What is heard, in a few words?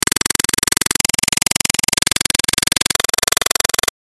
bad clicking